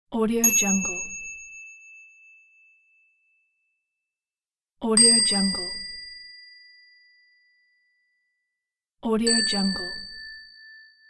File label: ding bell; phillipmariani